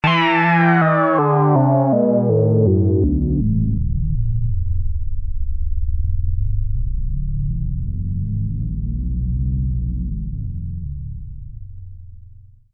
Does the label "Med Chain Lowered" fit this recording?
No